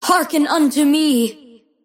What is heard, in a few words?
attack pain; Invoker